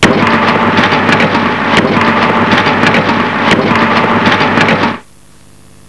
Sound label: Mechanical